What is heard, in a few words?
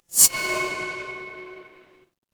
transition sweep